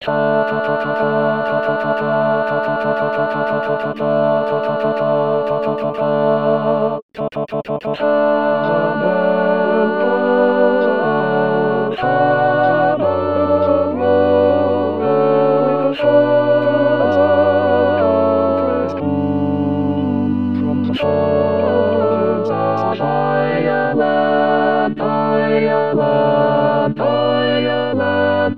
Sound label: Tutti